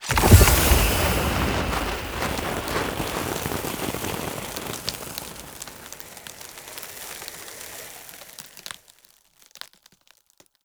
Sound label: Fire Rain Pull; Powers; Fenn